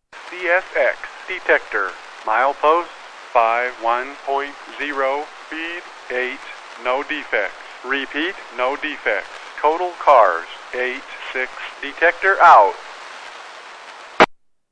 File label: csx detector mile 82 cars